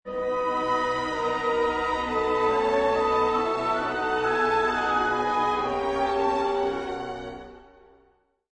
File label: Orchestra hit following by a quiet timpani decrescendo roll; Orchestral; Musical